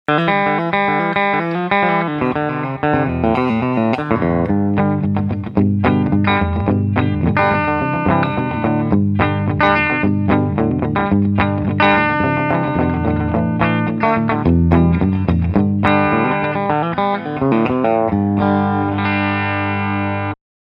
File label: hagstrom super viking steg pickup clean